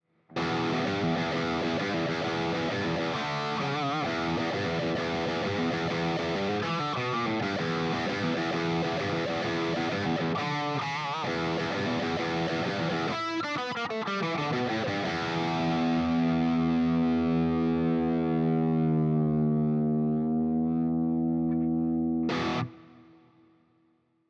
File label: HSS Bridge Drive